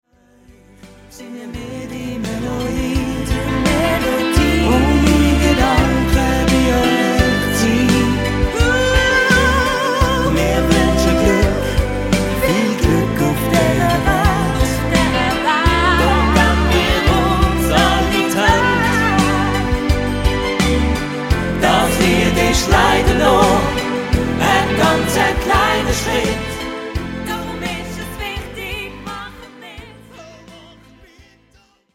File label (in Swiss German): hymne schweizerdeutsch; Lied; Mundart